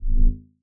large space ship short rumble; soundscrate

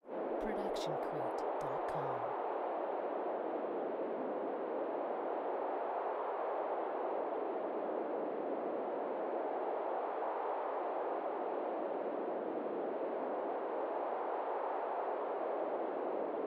moving winds; soundscrate

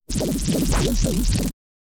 stylized glitch sound; soundscrate

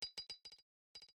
grenade roll

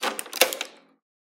stapler; soundscrate

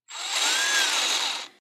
drill rev

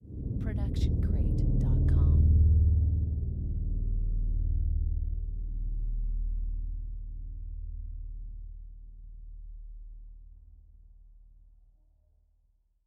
Creepy Low Grumble; SoundsCrate

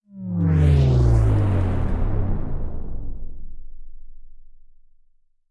Bass Drop

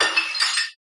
Glass Break; SoundsCrate

